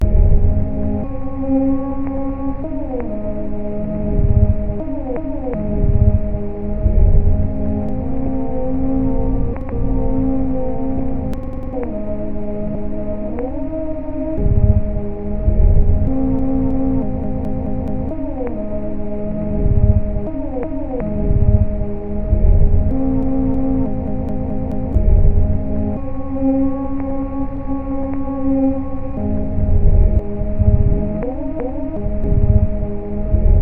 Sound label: slowed down violin sample; Matt Davignon